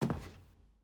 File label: Footstep Wood Stairs